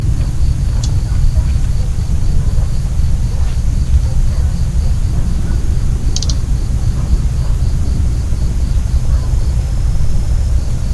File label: Red Breasted Flycatcher Call; gobemouche nain ficedula parva